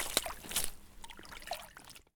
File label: swamp turn; Escape from Tarkov